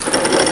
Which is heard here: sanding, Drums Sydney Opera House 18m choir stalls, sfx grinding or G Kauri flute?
sfx grinding